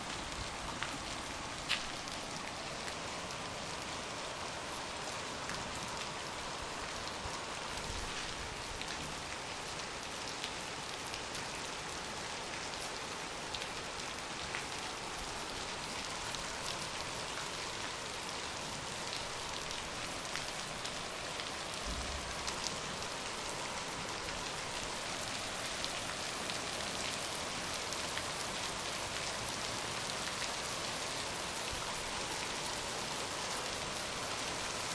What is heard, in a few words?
rain thunder and lightning